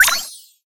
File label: spark light